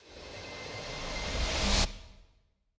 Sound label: Sweep Short